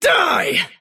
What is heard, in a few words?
attack pain; davion; dota